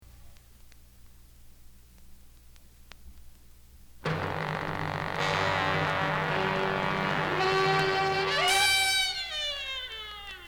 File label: Trumpet Cha Cha Cha